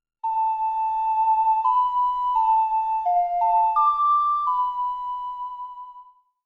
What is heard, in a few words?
Ocarina; The Legend of Zelda Majora's Mask